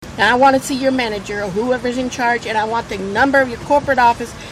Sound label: corporate